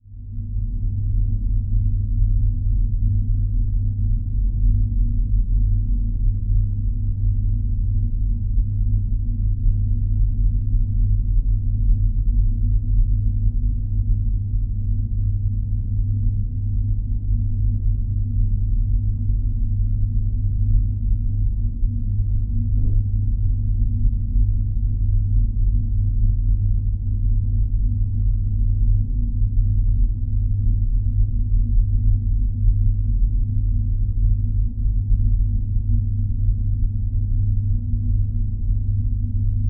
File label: Geofon, Rumbling, Dark, Drone; underground sounds